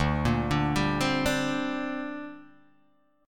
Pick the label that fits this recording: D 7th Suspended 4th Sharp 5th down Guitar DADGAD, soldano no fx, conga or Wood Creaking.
D 7th Suspended 4th Sharp 5th down Guitar DADGAD